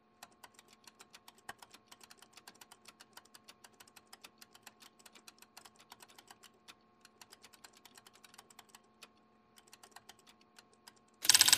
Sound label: Printer Scanning